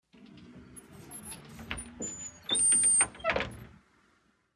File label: Brit Call Box Door Opn, foreign pay phones doors